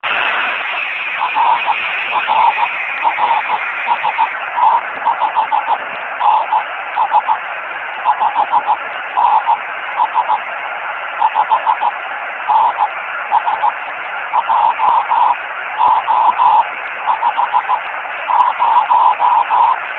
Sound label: 3cm cw ssb